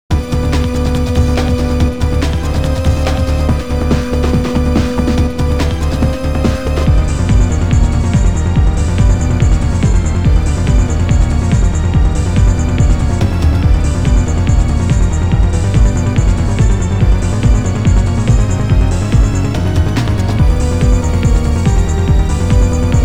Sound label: Battle Map, Swamp; Langrisser Milennium